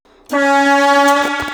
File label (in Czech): Houkacka vlak